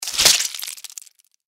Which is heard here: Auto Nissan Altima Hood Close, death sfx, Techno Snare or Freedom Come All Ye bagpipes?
death sfx